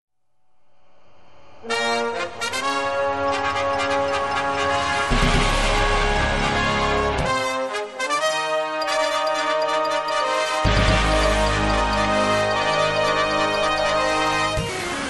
Big Sports Guitar, workout music

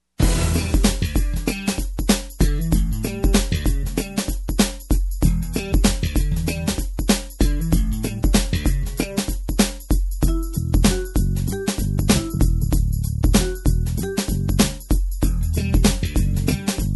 Night Beat

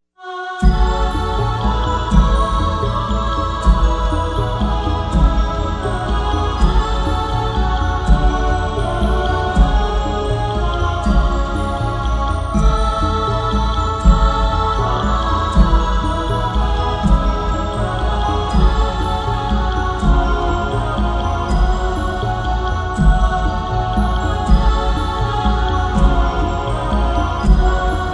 What Child is This Ambient; Religious Holiday Songs

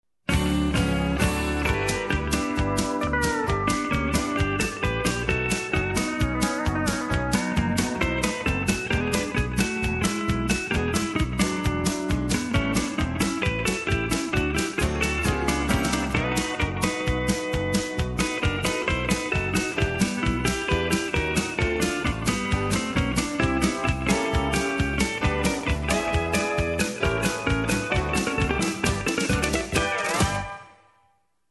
Fast Country Jazz, bluegrass music; Album: Country Music

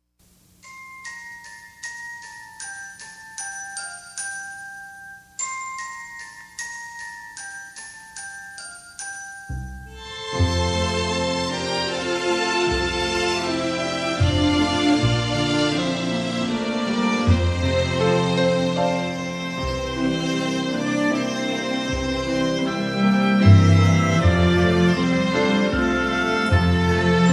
Orchestra Christmas Medley; Peoples Symphony Orchestra of Vienna; Religious Holiday Songs

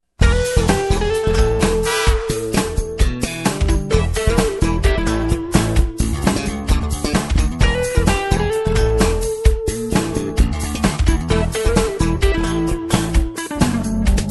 Guitar

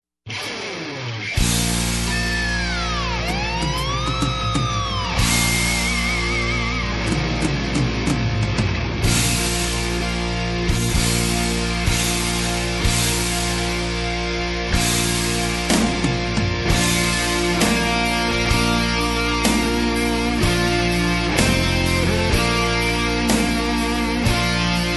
Heavy Metal Bridal Chorus; contemporary wedding songs

